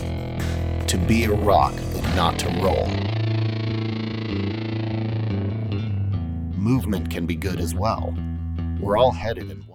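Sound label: Stillness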